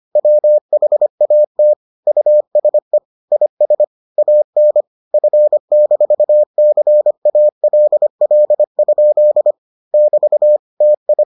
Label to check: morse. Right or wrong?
Right